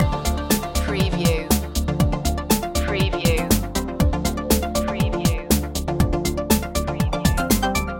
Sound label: analogica loop, chill